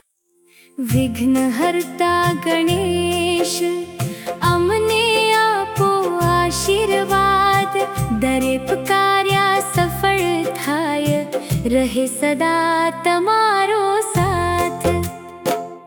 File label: gujarati vighnaharta ganesh, ringtone